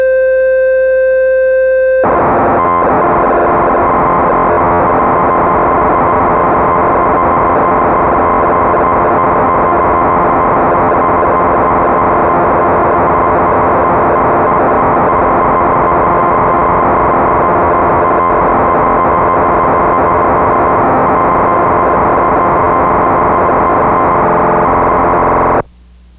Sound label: Crumar Bit One